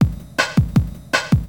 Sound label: DRUMLOOP D+B 160, Drum+Bass Loop; eJay